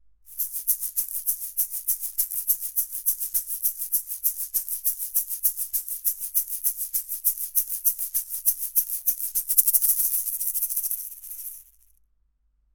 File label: shaker a kugel flat; manley reference silver